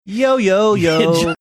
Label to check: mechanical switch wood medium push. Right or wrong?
Wrong